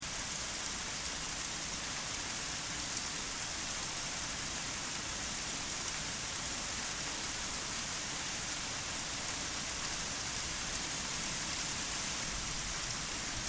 Rain loop long, Rain, Heavy; Rain Sound Effects; Rain (Sound Effects:Weather); Stuart Duffield

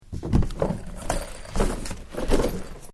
Suitcase Lid Open, Squeak; Travellers case; Squeak Sound Effects; Squeaks (Sound Effects:Objects)

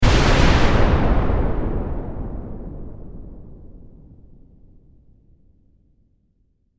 Explosion Massive; Explosions

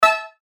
Chime High Tech; Chimes; Multimedia; Blastwave

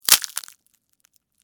Texture, Crack, Crunch, Ice, Cream, Cone; Food Sound Effects; BlastWave

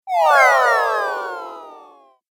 8 Bit Style Fall; Video Game Sound Effects

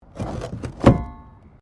PLASTIC BARRICADE METAL PIN MOVE; Material components for constructing more complex sounds

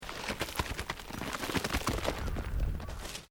ROCK TUMBLING

